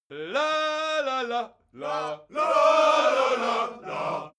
crowd musical bawling

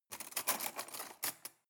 cutlery cake fork drawer handle

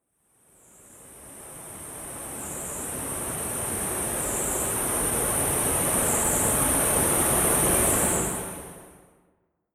wind wave

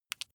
flesh wet greasy squish single

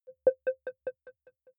button echo distorted

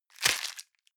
plastic bag small grab hard

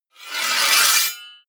scrape

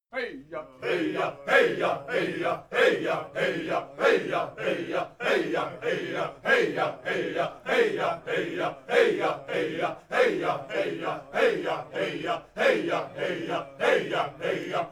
chants simple

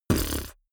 beatbox bass drum wobble

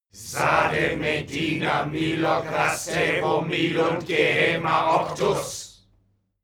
male crowd evoke

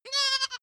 goat baa lamb classic short

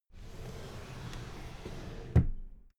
drawer cabinet paper close soft

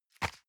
envelope drop medium slide

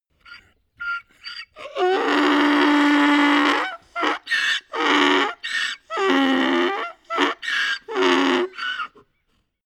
donkey scream

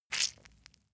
leather impact mud soft squeeze

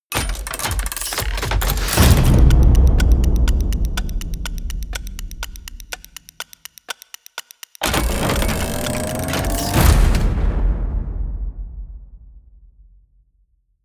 promo pad

